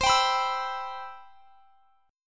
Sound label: C Major 7th, chord; CM7 strummed